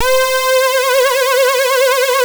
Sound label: Synth and Organ; InVision Interactive; Keith Emerson; The Most Dangerous Synth and Organ